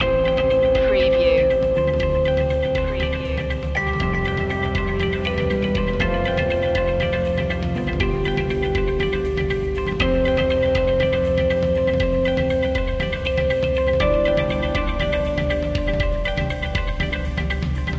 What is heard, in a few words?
corporate innovations loop